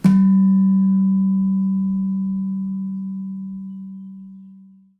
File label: slanthem; Saron